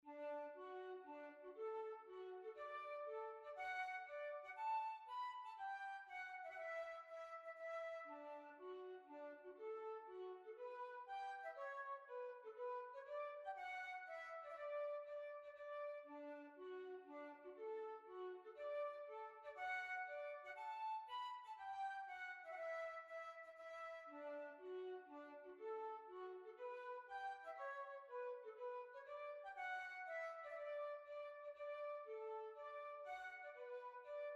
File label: March